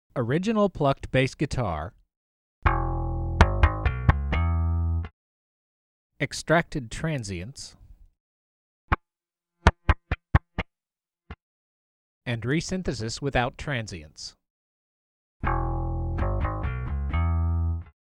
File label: Deterministic and Transients